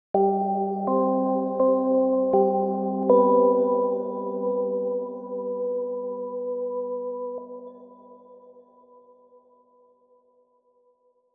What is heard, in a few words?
Pipeorgan Correctmelody; W Pipeorgan Correctmelody